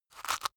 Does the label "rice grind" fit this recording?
Yes